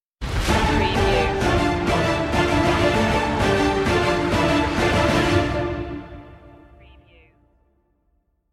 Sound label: through the shadowlands stinger